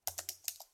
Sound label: Computer Keyboard Group; SoundsCrate